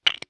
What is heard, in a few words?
Lego Click